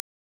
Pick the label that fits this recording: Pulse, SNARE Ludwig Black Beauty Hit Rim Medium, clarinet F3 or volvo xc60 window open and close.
Pulse